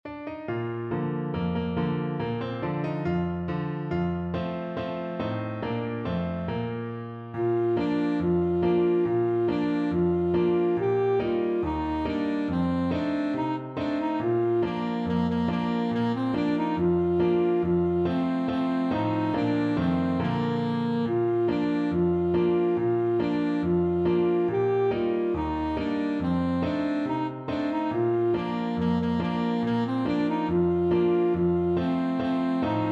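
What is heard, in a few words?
alto saxophone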